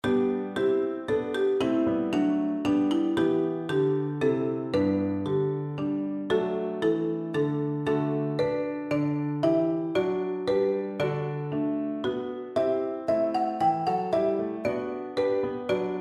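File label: marimba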